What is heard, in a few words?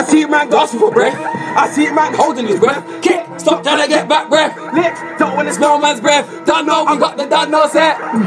breath